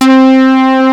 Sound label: SMOOTH; Rubber Chicken Software Sample Bank